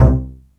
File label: PIZZ C1; East West; Global Sounds